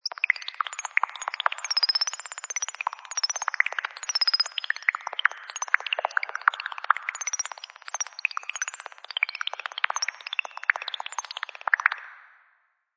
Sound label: Watr Robot, Watery electronic sound; Strange Tones; Sound Effects:Sound Design